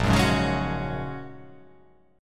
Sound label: A sharp Minor 7th Sharp 5th A sharp